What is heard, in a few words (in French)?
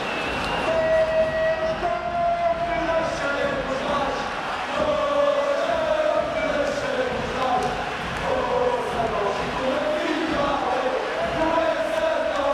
Chants; lisbonne; Benfica Es a Nossa Fe